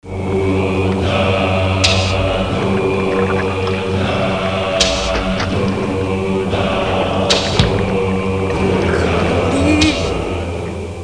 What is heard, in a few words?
ooh